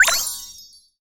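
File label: spark light, impact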